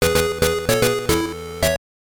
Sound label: Jingle; doraemon no game boy de asobouyo deluxe 10 game boy